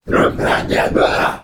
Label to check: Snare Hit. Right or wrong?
Wrong